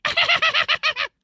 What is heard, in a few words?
Goblin creepy laugh